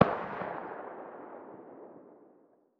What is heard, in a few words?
fire alt far distant; Rust